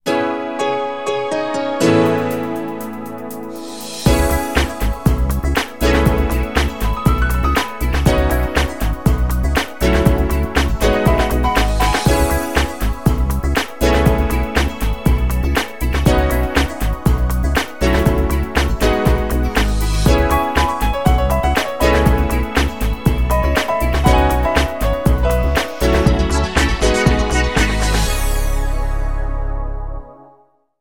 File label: Piano Flourish Song